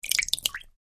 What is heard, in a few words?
Liquid hands in water tiny movements